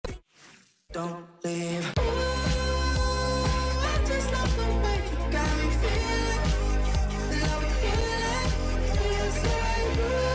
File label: uuuuuuuuuuuuuuuuuuuuuuuuuuuuuuuuuuuuuuuuuuu